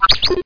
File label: EEEK